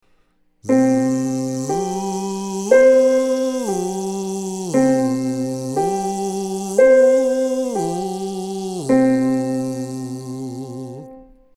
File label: Z Hiss Octave